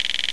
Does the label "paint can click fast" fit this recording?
Yes